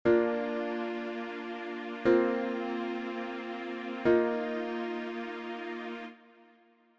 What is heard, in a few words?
Bb Maj Sus Chords